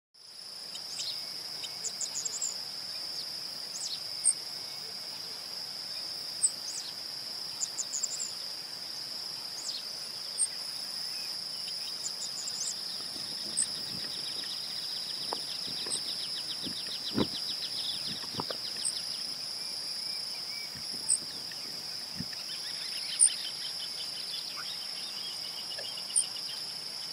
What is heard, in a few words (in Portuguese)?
Canto do tico tico de mascara negra